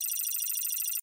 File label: terminal message